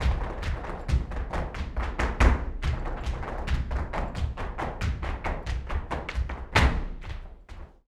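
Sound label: percussion, ALEGRIAS TAP, Flamenco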